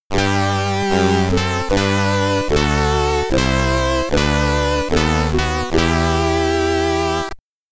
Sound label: West Germany Deutschlandlied (SID Stereo)